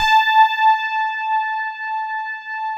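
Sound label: GLASS Rhodes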